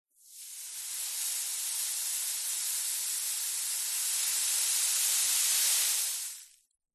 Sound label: sand pour; silverplatter audio